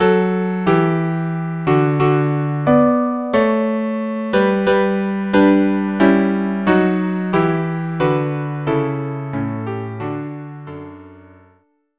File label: trio; Peasant Song